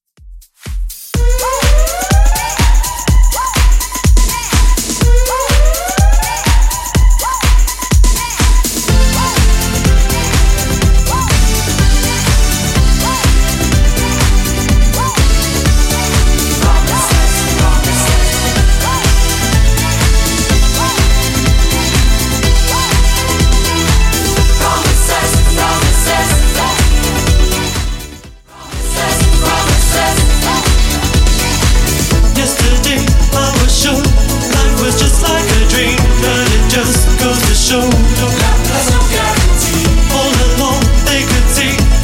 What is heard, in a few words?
90s Redrum 124bpm; remixplanet